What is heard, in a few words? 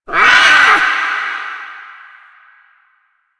Ambient Meow Far; Warframe; Kalymos